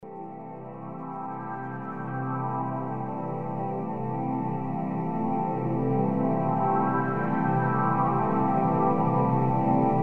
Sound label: Love tones SoundScape Whimsical ambience